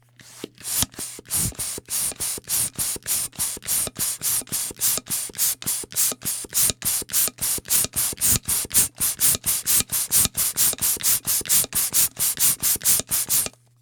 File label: Air Pumping